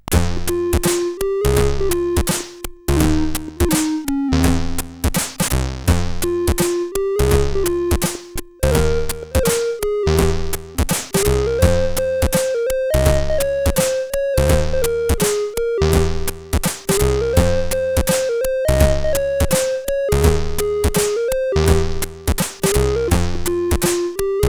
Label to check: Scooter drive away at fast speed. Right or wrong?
Wrong